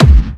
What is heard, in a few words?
Thud